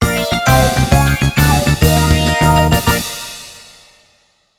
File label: Goal; Kororinpa